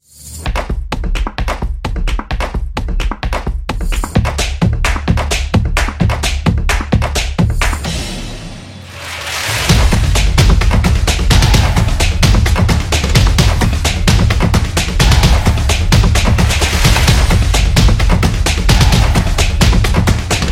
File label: Stomp Epic